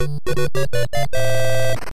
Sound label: Death; The Panda Prince; NES